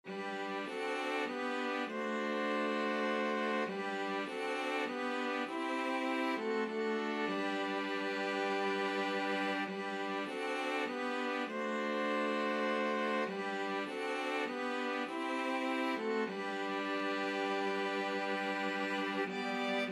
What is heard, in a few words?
be still my soul, string quartet